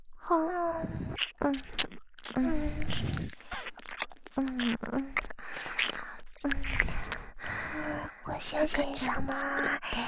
耳舐め; 赤ちゃん作ろ♪; ハーレムオナサポ 4人のメイドがご主人様のオナニーをお手伝いするバイノーラル～文、千曜、名月、莉桜～

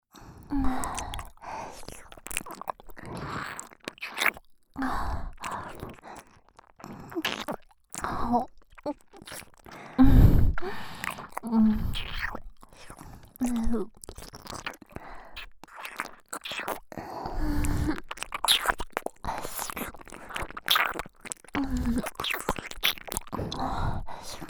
両耳舐め; L会長・R書記

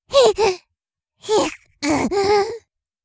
痙攣; 失神中

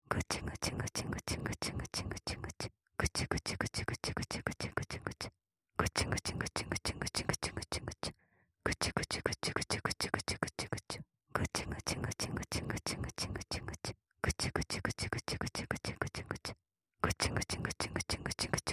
オノマトペ素材 ぐちゅぐちゅ

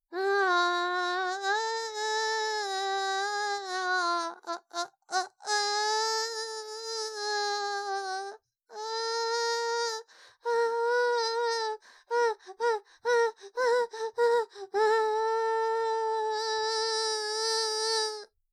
ノーマルマイク あーーーーーーー

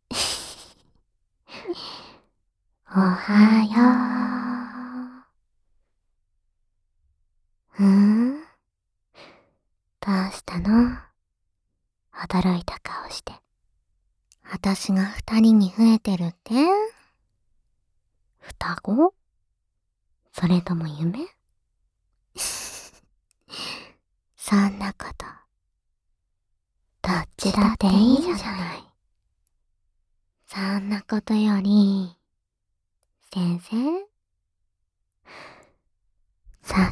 ダブル囁き耳舐め; 小悪魔おしえごニートJKと子作りえっち♪

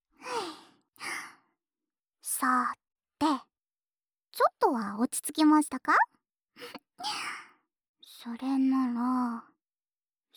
お兄さんのお耳、くすぐっちゃいますね?（左耳ふーふー）, ASMR

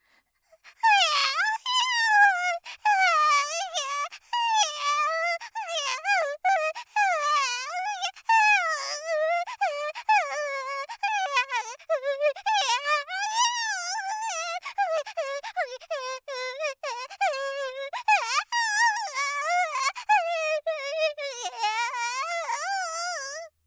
赤ちゃん （女児） ボイス

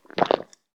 gokkun, ゴックン音のみ; 射精; 膣内射精

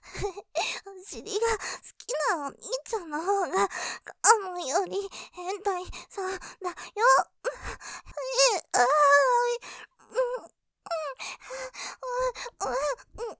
喘ぎ; お尻が好きなヘンタイさん！; ループで楽しむ！シェフの気まぐれ抜き抜きコース; モノラル

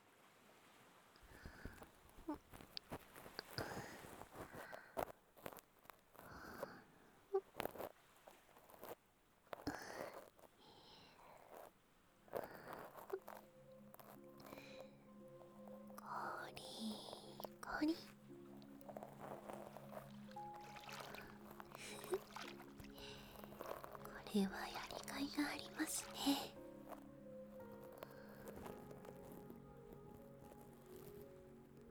右耳の耳かき, ASMR

